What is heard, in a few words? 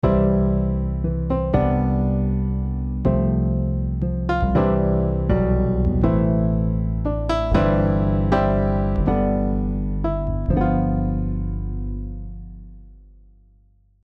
Emotional Piano Dry; Pigments